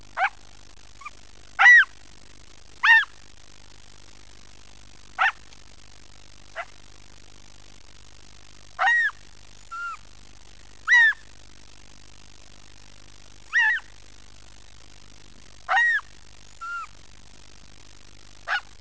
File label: fox kit chirp